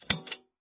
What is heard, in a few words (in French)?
piege loup